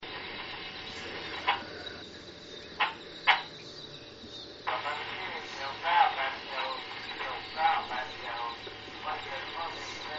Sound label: army radio